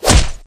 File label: gen hit, weapons; Paradise